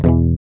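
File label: GUI E, acoustic guitar; Best Service Gigapack 2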